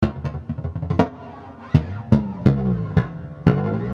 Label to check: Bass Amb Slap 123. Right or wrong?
Right